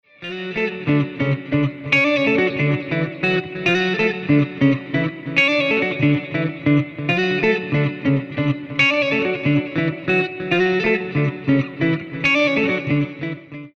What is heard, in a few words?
EG Riff Solo